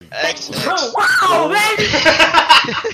sneeze

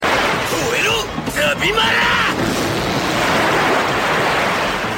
howl; zabimaru

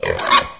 guard hurt; gammorean guard; lego star wars

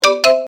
iphone calypso

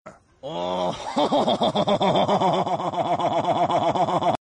jackhammer; family guy; jacques hammer

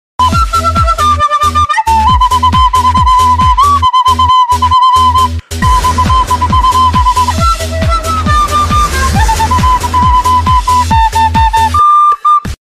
flute anime version

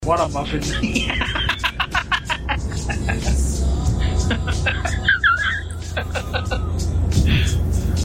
laughing cupcake

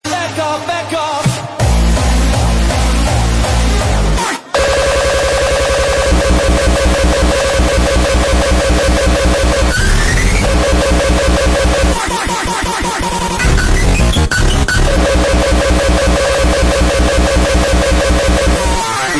hardstyle hardcore

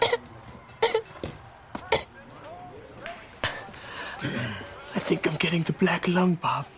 weak cough

